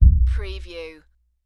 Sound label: Underwater Impact